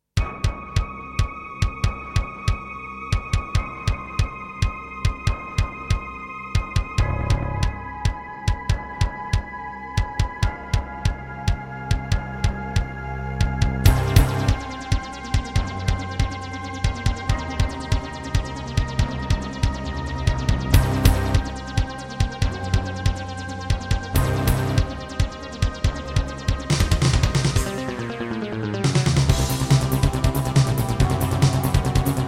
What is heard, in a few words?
Alesis NanoSynth; sigil doom episode 5